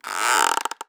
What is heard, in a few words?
Clean Decelerating Creak